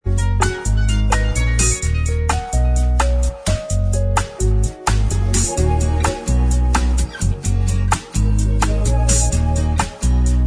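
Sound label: bossa mix instrumental track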